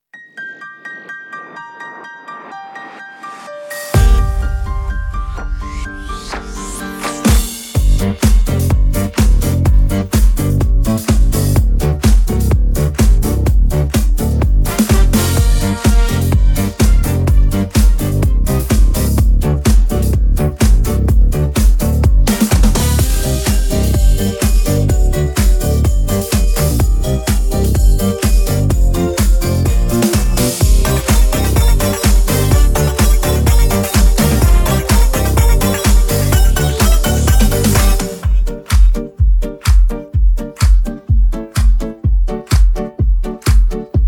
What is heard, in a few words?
dozhdik instrumental; минус детской песни; минусовка